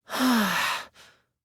sigh

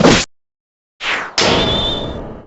salute; p4u; aigis

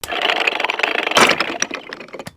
globe drop

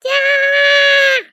scream

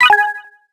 puzzle hint; layton

